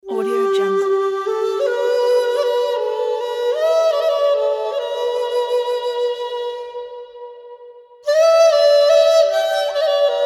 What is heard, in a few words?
chinese dizi flute melody